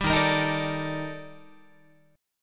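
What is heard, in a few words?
E flat Major Sharp 11th, Guitar; strummed; chord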